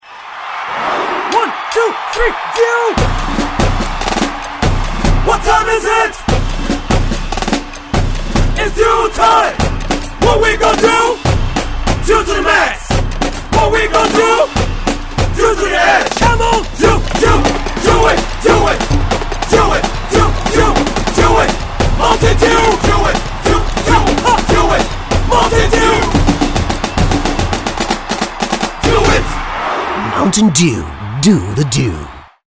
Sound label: Drumline Radio; Mountain Dew